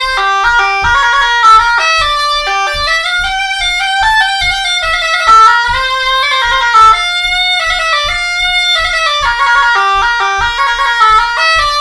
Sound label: mazurka